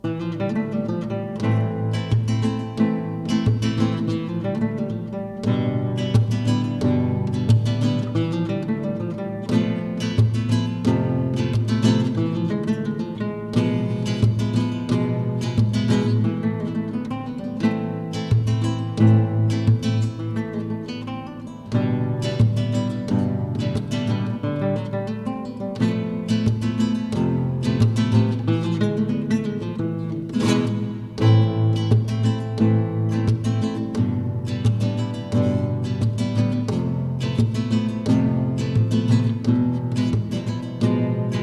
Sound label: Rumba; sevilla